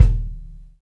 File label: XV Kick, Roland XV-5080